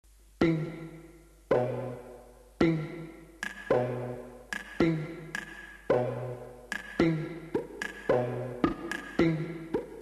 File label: pingpong